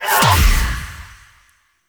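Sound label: Reservoir Impact; Warframe; Wisp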